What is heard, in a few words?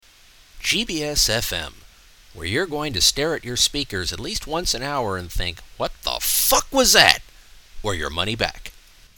jingle